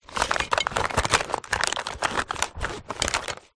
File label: household makeup in pouch rummage; zapsplat